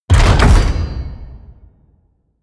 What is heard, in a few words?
mechanical switch metal large push; industrie-wirtschaft; mechanik